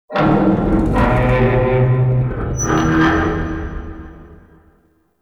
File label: Assassinate Escalation Tank Vocal; Warframe; Nineteeen Ninety Nine